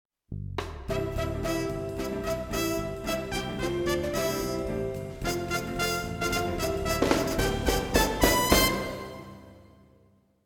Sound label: Jingle End; Madagascar (2005)